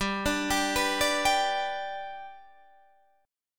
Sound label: G Major, Guitar, Standard; arpeggiated; 15,17,17,16,15,15